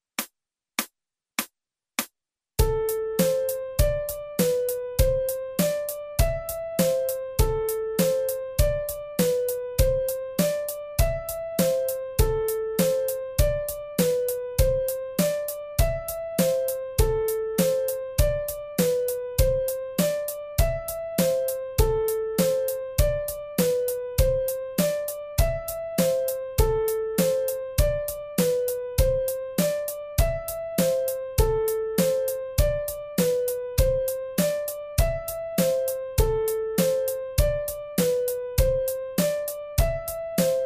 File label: Piano